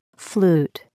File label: flute